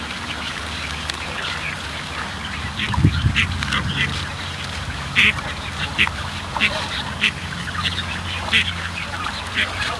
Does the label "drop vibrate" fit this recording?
No